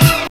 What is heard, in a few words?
DISCO FX; Zero-G; Funky Elements